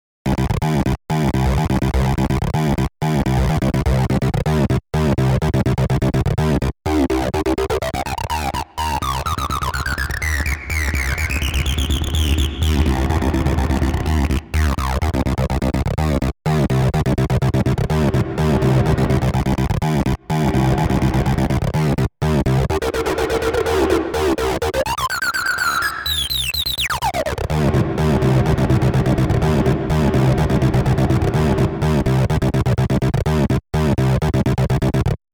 Distorted lead patch with reverb

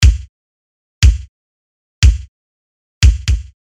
Beat Dissected Nu Disco Cosmic Disco

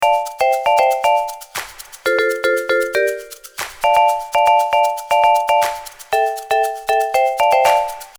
Vibraphone

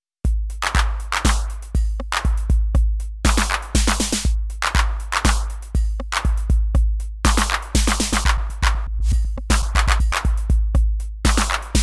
scatter effects

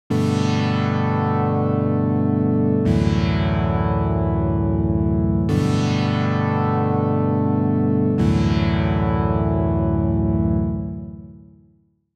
Massive Analogish

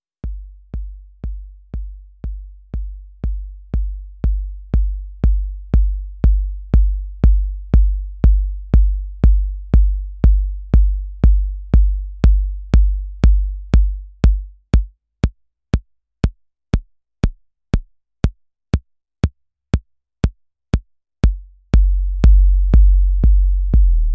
808 kick compression

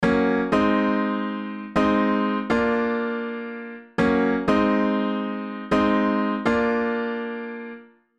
Chords

